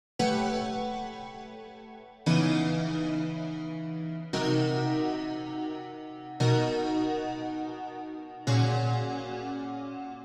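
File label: chords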